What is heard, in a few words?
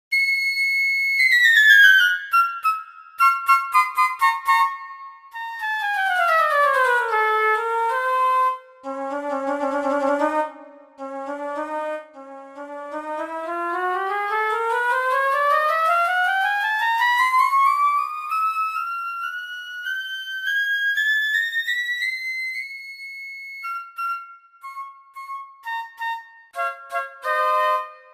Flute